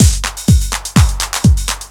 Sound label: hum hats etc, Deep House 125bpm